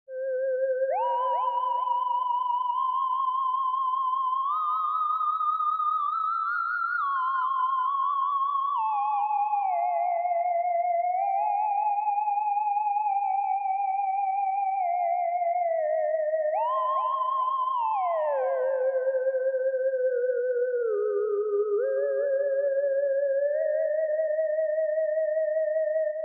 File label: cheap horror theremin only